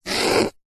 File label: foley foam mark score or draw on